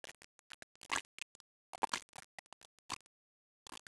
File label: mud squishes; ambience; sadpuppy; muddy